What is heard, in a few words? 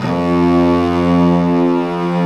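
cello C; AKAI S-Series CD-ROM Sound Library VOL-2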